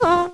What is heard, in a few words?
Inhale; Adium